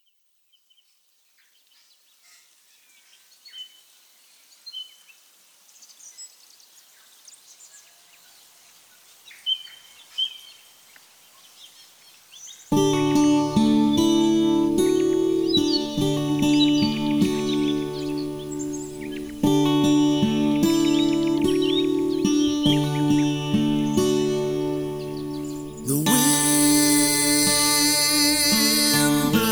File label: ambiance harmony karaoke